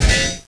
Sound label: Cannon Hit; A-10 Cuba; PC Gamer 40